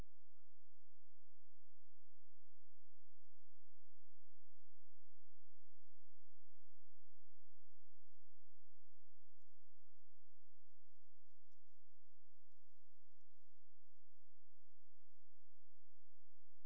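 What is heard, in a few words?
hydrophone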